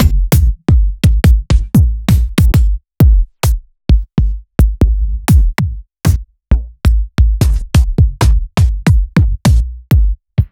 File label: Bassdrums; Sliced